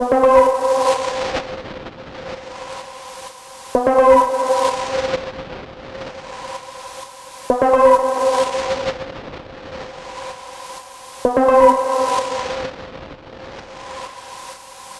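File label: synth pluck 128; obsidian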